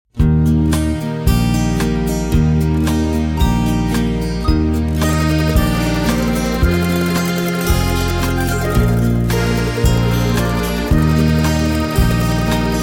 (Eb) No Backing Vocals

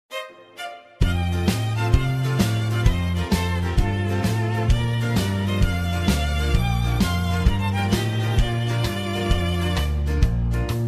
(Bb) No Backing Vocals

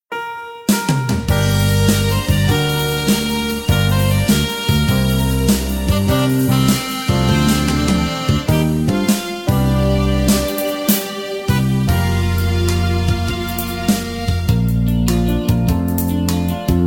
(Bb To B) No Backing Vocals

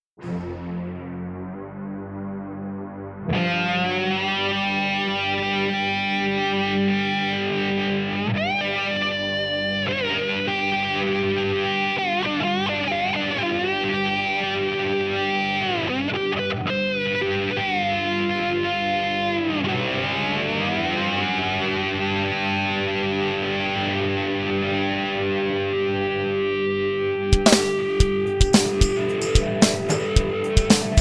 (G) No Backing Vocals

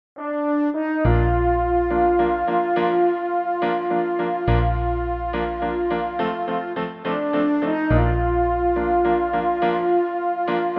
No Backing Vocals

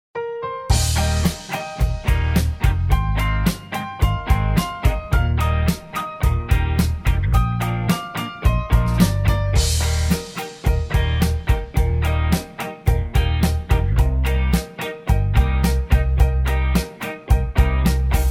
Eb No Backing Vocals, backing tracks

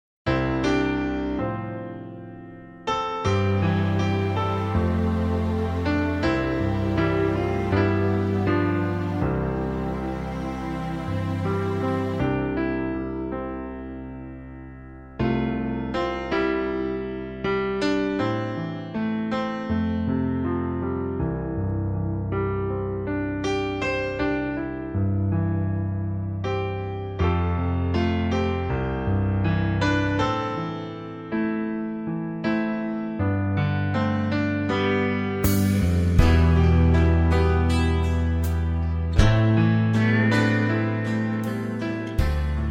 (C) No Backing Vocals

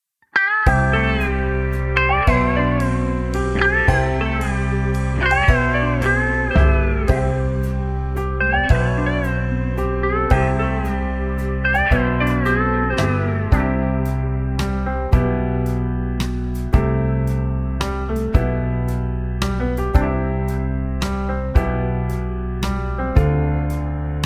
C No Backing Vocals, backing tracks

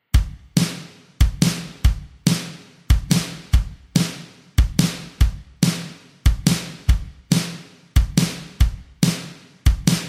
F No Backing Vocals, backing tracks

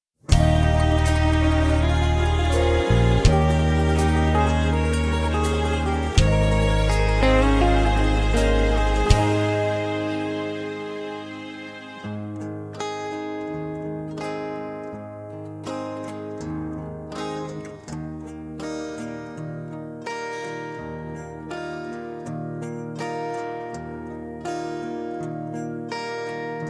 The Rose Is For Today (Ab) No Backing Vocals

